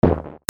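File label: percussion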